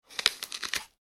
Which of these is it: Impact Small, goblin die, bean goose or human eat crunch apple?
human eat crunch apple